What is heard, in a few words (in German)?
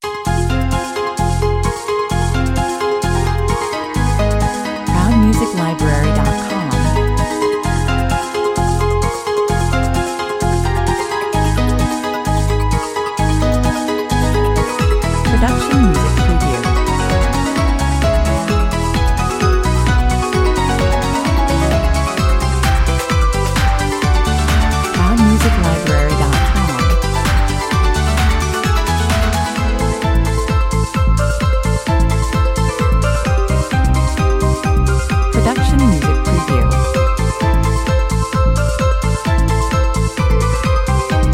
(translucent mix) 130 bpm, Sehr flottes und elegantes Grundthema, E-Combo mit orchestraler Unterstützung